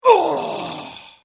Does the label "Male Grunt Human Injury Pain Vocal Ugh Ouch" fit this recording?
Yes